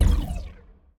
ball collision geo ricco

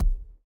Ball Player Hand Hit Tackleball Extra Light

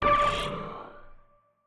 Player Join Confirm

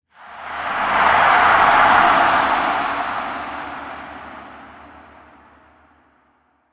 Hype Swell; Sports; Tackleball

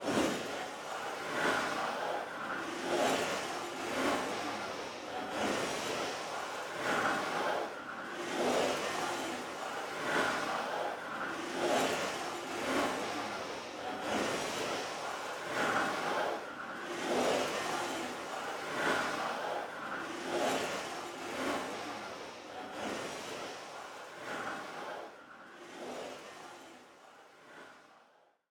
Player Hand Slide Rail Loop Combined

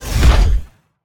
Rocket Long Pull

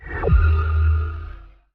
Flag Returned; CTF

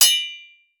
Sword; Wieldables

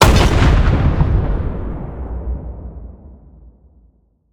Bomb Explode; Scraprun; Deathrun

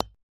Ball Collision Golfball Hard

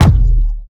Player Head Collide

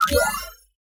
Quest Pickup Item; Quests

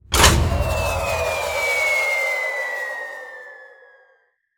Bomb Release And Fall; Scraprun; Deathrun

